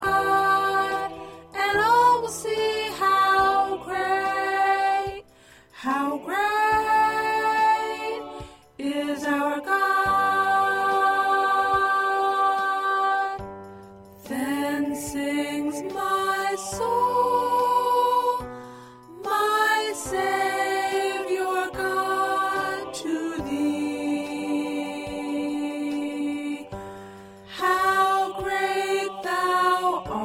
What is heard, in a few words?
Soprano, choir parts